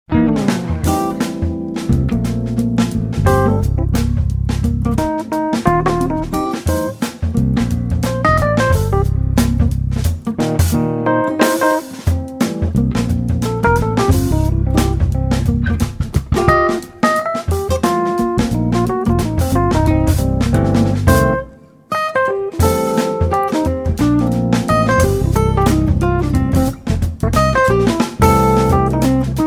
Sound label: quartet